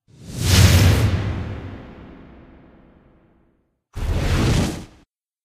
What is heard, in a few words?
enter Bonus